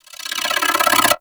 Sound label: Head Spin; Enemies; Abilities; Warframe; Corpus